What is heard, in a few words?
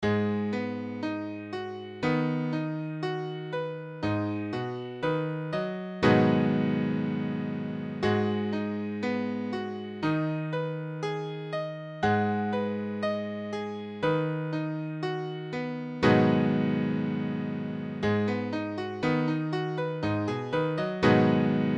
Abm7, piano